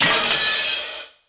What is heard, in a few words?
Glass Breaking